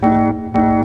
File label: Doorbell